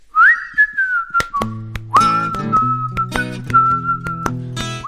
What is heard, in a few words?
Whistle Lips, Sting